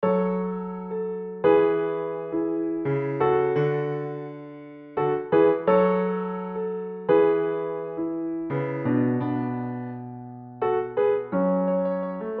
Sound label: solo, piano; Race